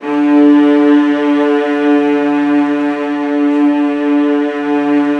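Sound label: VIOLAS E3, Strings; ILIO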